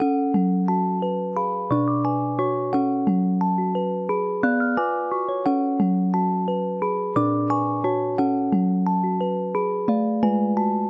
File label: pretty bell melody, synth and electronic loops